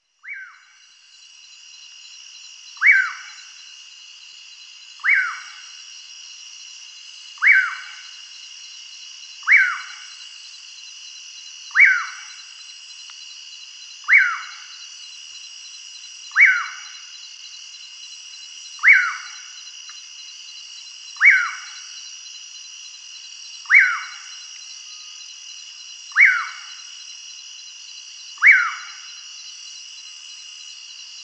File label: hydropsalis albicollis curiango; fauna